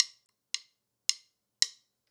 110BPM, acoustic drums; The Roots Drumloops

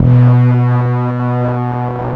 Dist Harmonic One Shot B, Bass; Bass X

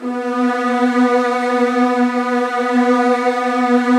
B1, Virtuoso Strings

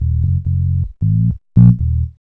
B-LOOP

